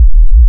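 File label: waveform FM sine sine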